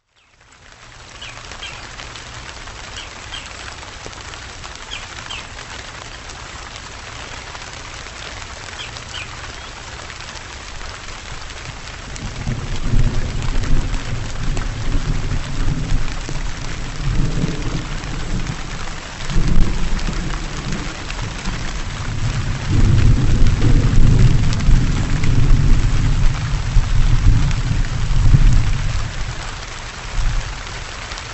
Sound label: Thunderstorm